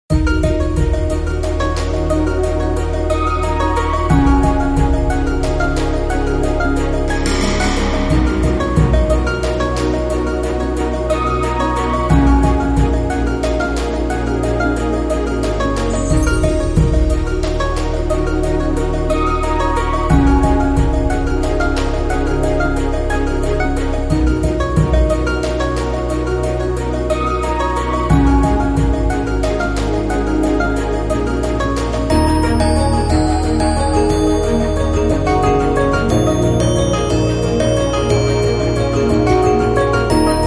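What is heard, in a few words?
Disco Strings Short Rhythm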